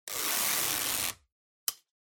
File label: Sellotape Pull Rip